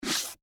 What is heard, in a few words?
High Heels Scrape, Footsteps, High, Heels, Scrape, Wood, Surface